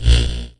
Laser static; weapons

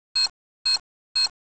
c4 beep; cstrike

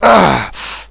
pain; hostage

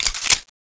button click

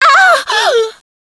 ahh

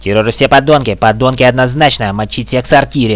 startle, hostage reactions

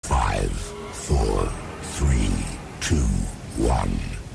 countdown

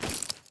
DRAW, weapons

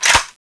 pkm clip out, weapons

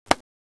knife; csgo; knife widow; widow lookat2 end

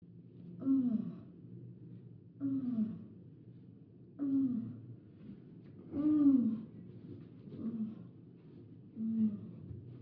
ahh uhh; cs thera

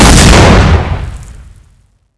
explode

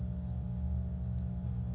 ambient garage; csg militia

